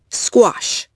squash

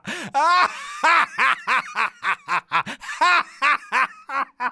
laugh

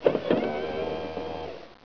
Hangar Droid; XWing

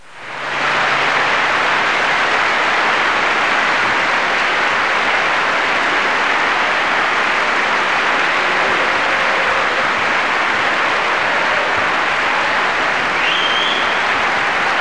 applause

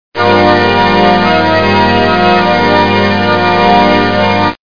organ; MUSIC; FSSD SoundEdit Mac Sound

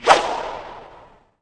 whoosh in

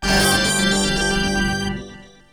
Radio Active Beep

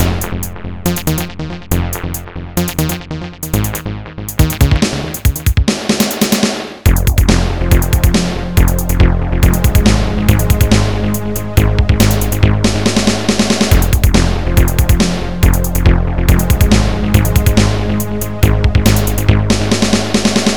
Rock; balloon rain; Music

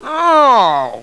No Schzapp; Chiral 1.0.1

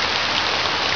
red rain fall; Heretic II demo; weapons

